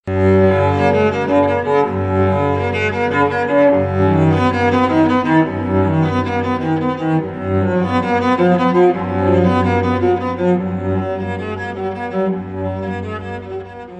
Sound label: Bach cello